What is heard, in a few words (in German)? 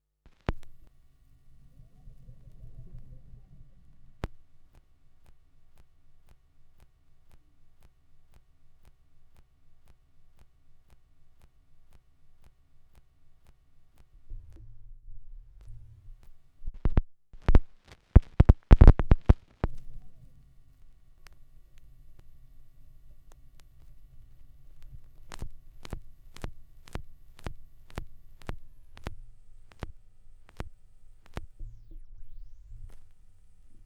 schallplattengeraeusche, Vinyl; wendelrekords 02